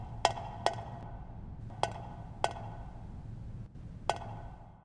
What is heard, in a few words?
Water Drip